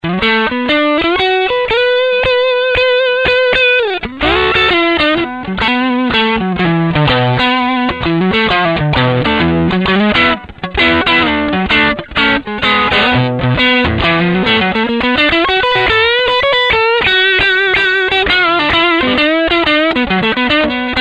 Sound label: Fender Blackface Deluxe